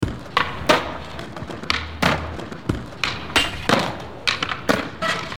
Skate Break

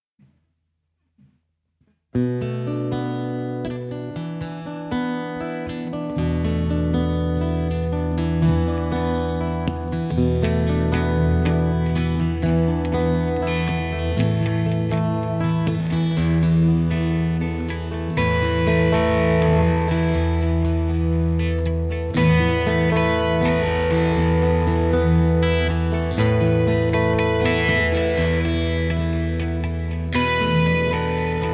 E120 Harmonics Motif

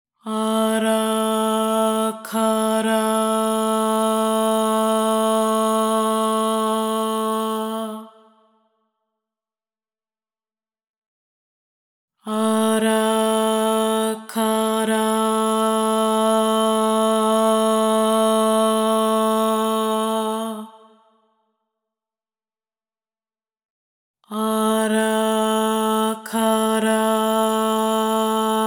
432 hz Reverb; MixterPlus

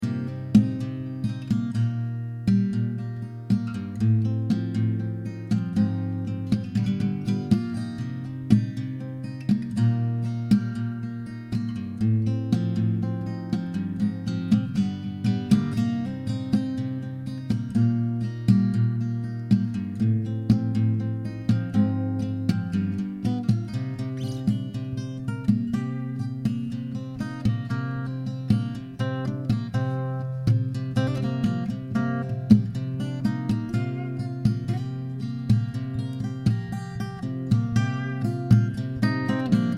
Cm120 Acoustic Doodle

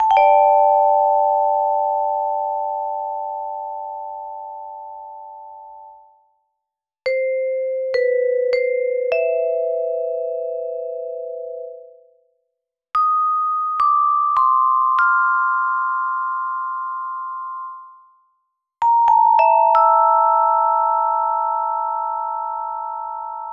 Vibraphone

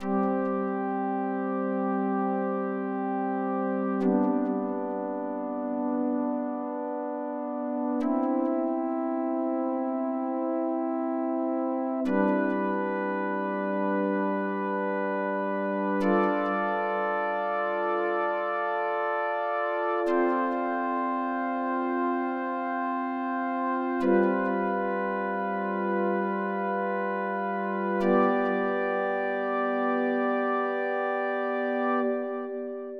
Keys

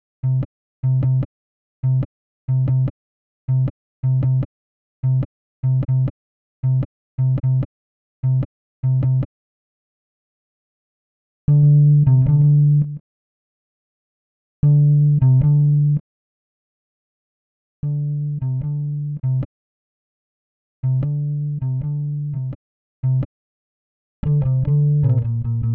magnetic Bass Modified

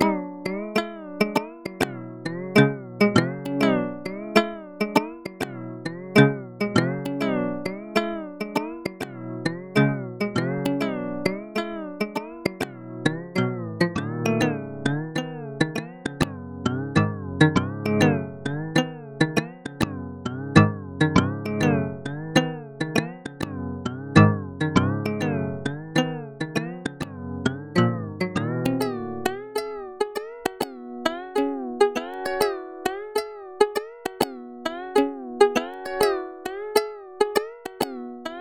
Ukulele

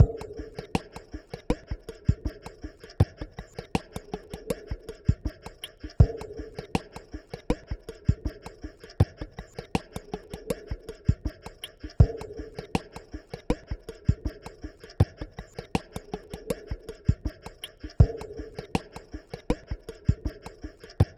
beat 80 bpm; 16-bar; Mixter Plus Deep Roots beat pack

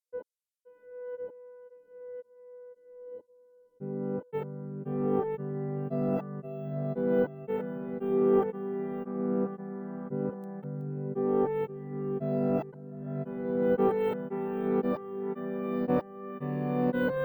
Piano Piece

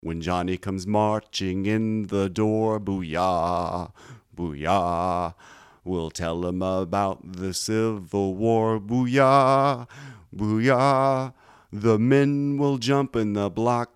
79.956 BPM

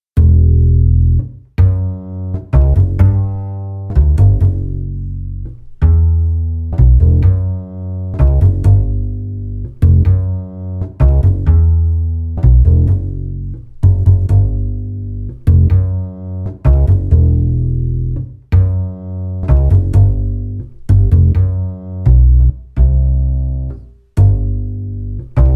Jazz Blues in Em 85 bpm

